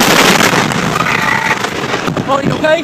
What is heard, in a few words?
explosion; Crash Bang Boom